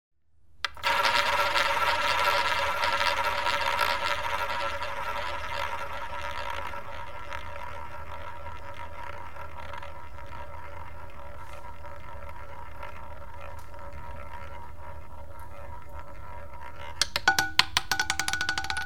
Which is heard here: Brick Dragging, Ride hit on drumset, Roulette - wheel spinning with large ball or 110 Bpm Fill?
Roulette - wheel spinning with large ball